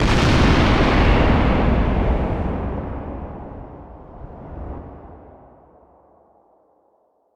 Explosion; Order the Planets

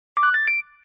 success jingle

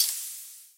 Play Button Hover

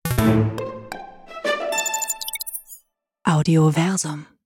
soundlogo

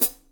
hihat foot, drums; standard

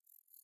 Hover in

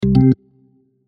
new msg

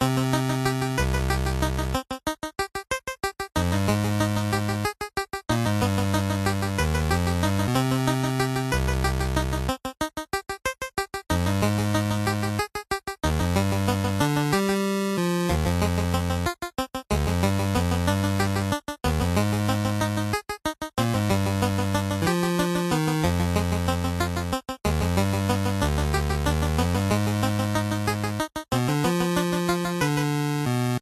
8bit; sketchy situation; tarochi

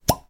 bubbles

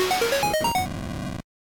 levelup

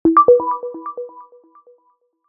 price alert; webtrading

